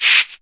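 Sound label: CABASSA, percussion kit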